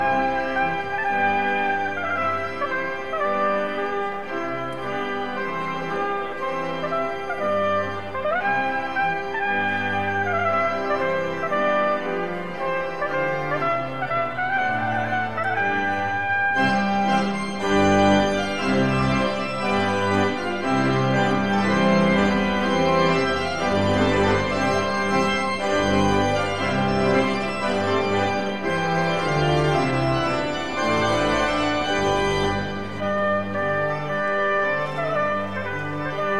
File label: Trumpet Tune